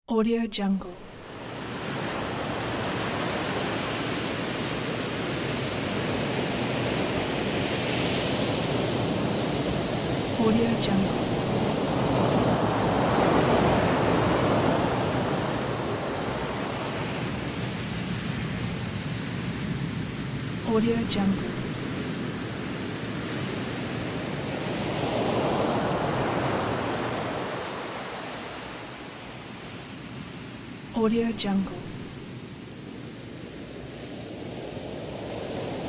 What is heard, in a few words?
distant ocean waves